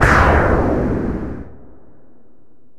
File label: laser slam; ExperimentalGear